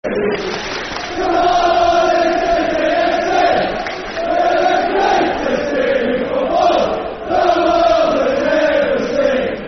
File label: Football Chants; Cardiff City; fanchants-com